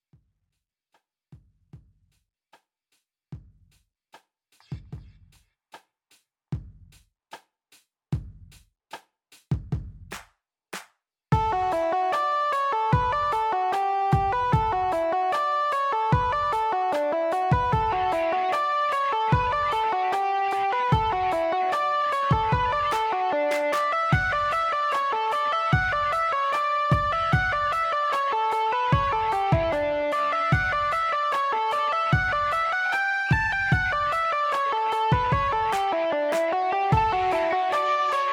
A set of reels, mandolin; The Sunny Banks/The Mills Are Grinding

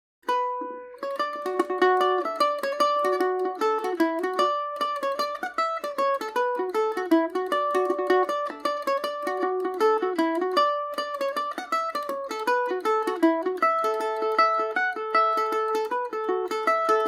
dublin reel dmaj revisited, mandolin

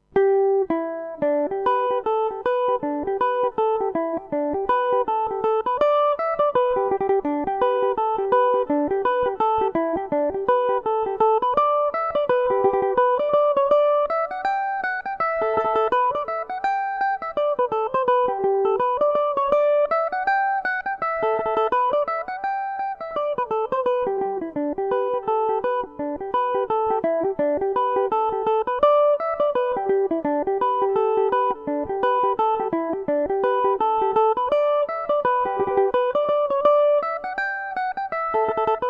glentown reel gmaj, mandolin; The Glentown Reel; Revelation Jaguar electric mandolin

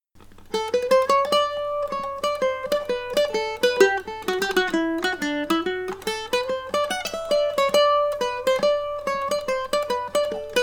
japanese hornpipe, irish mandolin

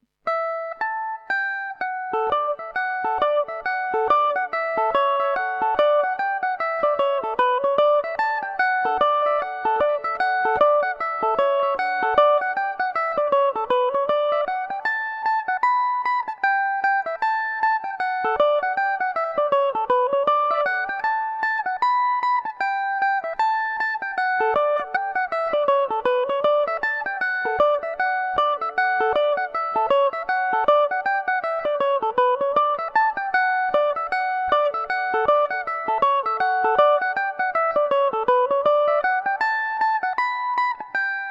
fairy reel dmaj, mandolin

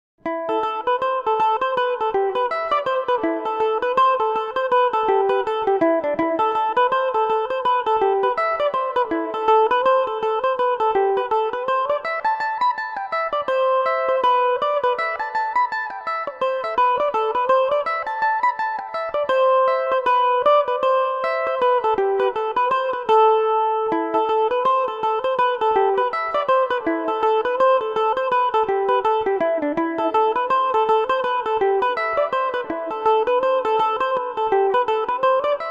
streets of paradise ador, mandolin; The Streets Of Paradise; Revelation Jaguar electric mandolin

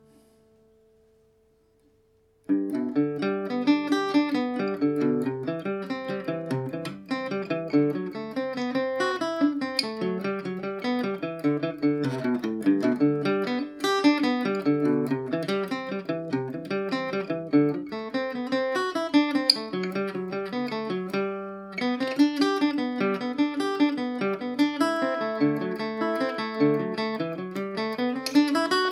gmaj, mandolin; The Girls Of Hackney

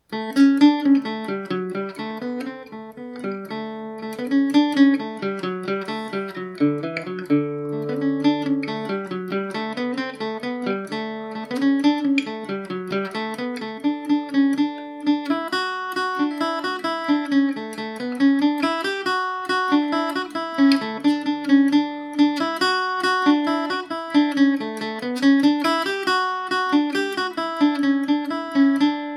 dmaj, mandolin; One Tree Hill

